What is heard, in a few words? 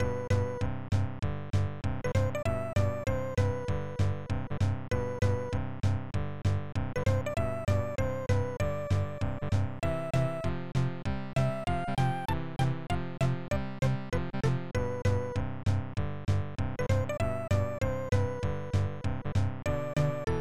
Level Music; Clock Works; SNES